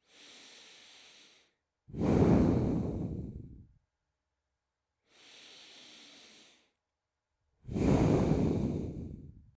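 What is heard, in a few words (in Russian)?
звук дыхания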